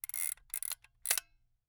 Camera, Aimex SP500, point n shoot, plastic, wind film; Eiravaein Works; Vaeyan IV